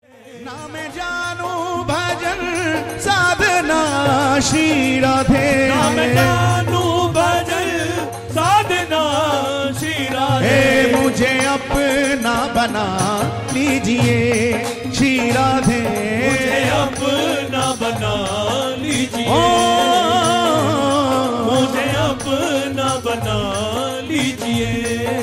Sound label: Bhajan Ringtone